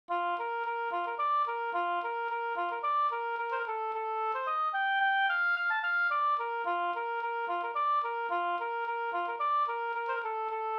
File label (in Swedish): slängpolska